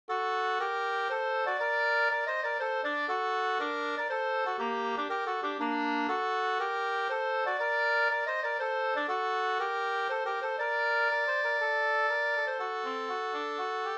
folkdans; Skralaten fr Vr Ahlstrom178 2st

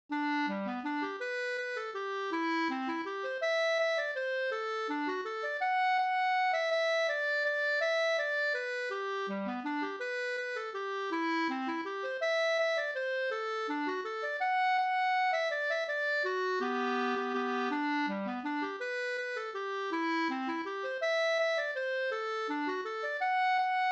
vals Brudvals; fr Skackan Vg e Ernst Wallberg

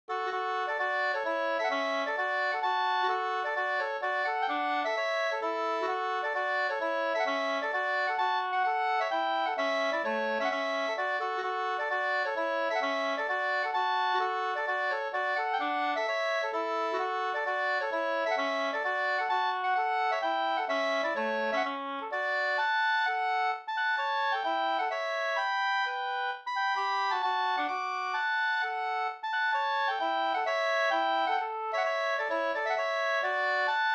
hambopolska; Ahlstrom147

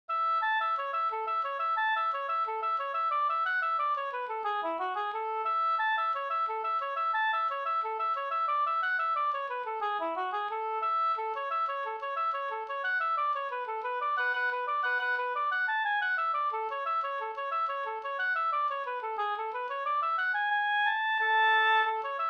engelska; fr Lilla Edet

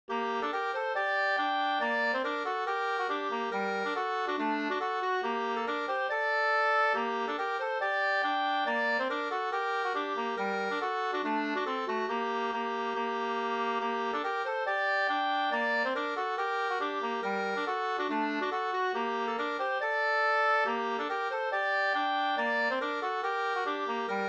schottis; e Knaft-Jonke Vb NSG689 2st V1

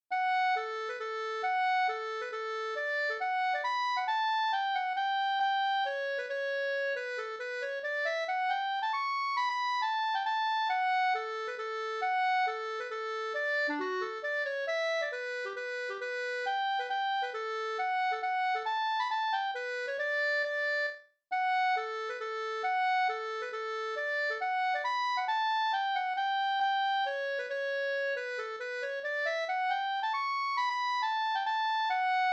mazurka

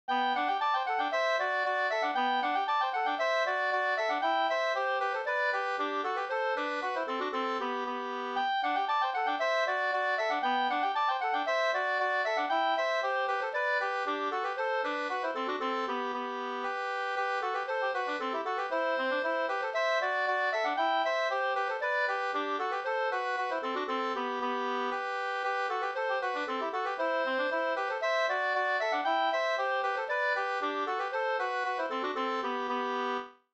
slangpolska

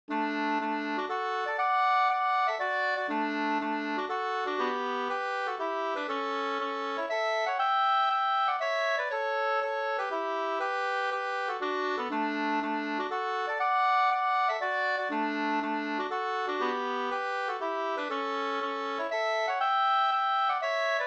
polska Bodapolska D dur polskan

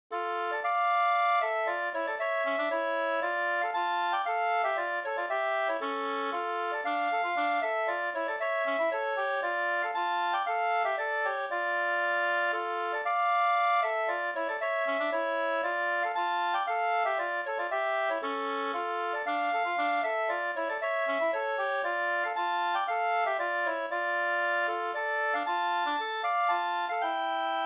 polska D moll

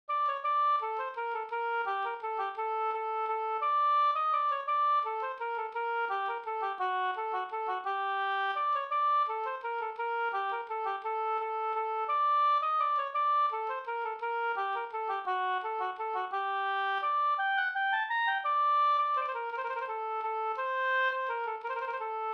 vals; Abraham Hagholm

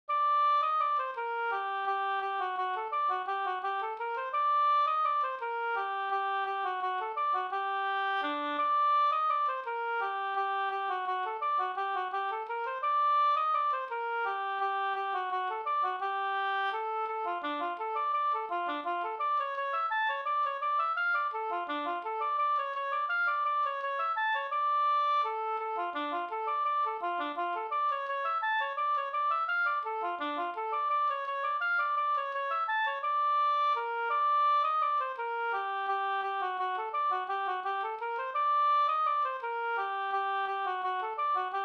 vals

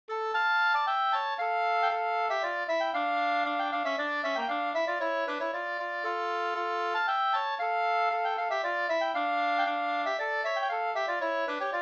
polska, 2st; Goran Lang Havero Mp

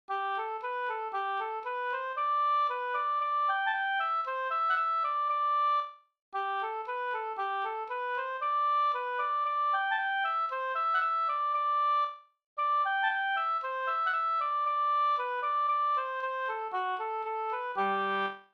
slängpolska

